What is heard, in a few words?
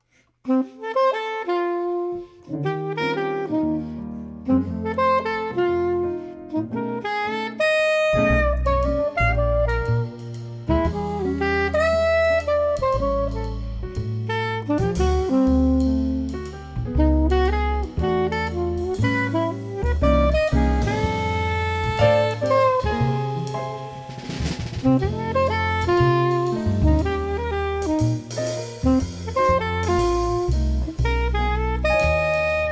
blues